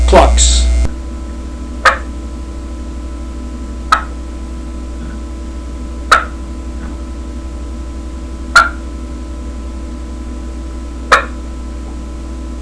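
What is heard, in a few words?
pro triple clucks, calls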